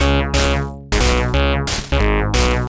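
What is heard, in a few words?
background music; The Speed Rumbler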